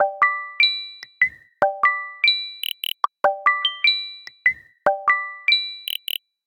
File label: Drizzle, alarms; Play It Loud